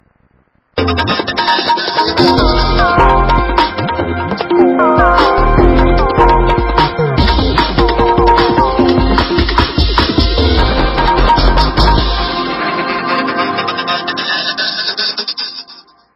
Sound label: tabla phantom ringtone